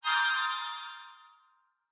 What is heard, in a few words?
all clear